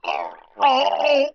player choke; PC Gamer IT CD 41; Daikatana

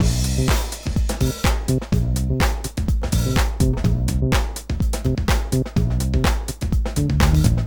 Housey Break

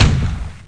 CANNON; duke nukem total meltdown

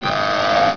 Moto

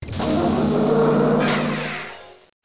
slide

